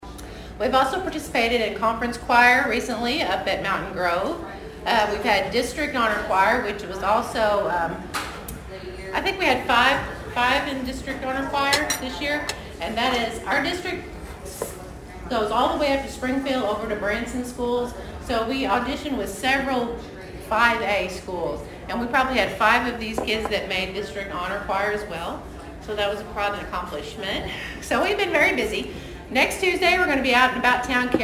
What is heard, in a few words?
Choir